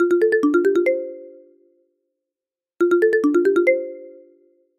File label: ringing